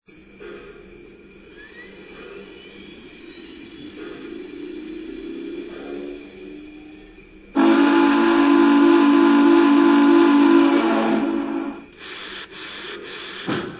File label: GS-4, electric trains